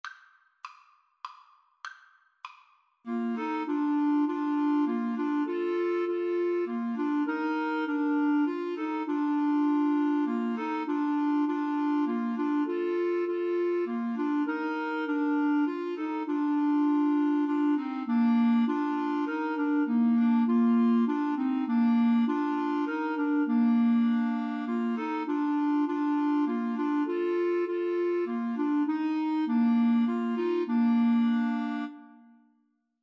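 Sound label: come thou fount 3 clarinet karaoke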